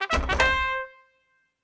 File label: tank coming; netent